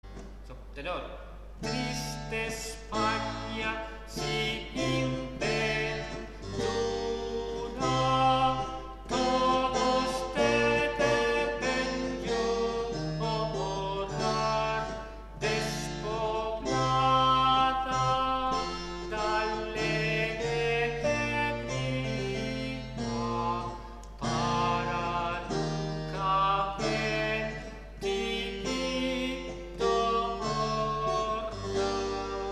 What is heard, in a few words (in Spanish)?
triste espanha tenor